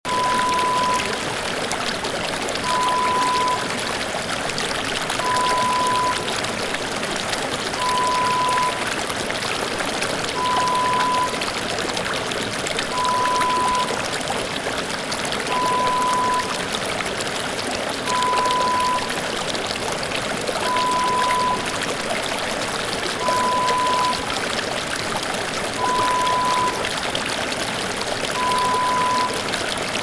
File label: Babbling brook